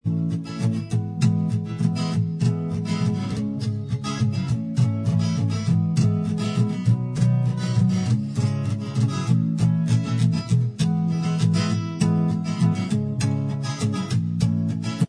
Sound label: acoustic style Inst Track